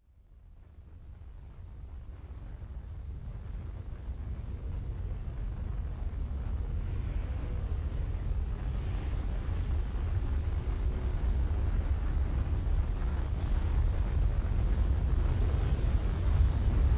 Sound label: Approaching Tornado